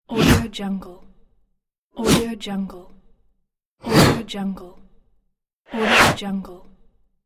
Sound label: swipes